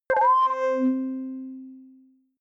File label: click ready boot high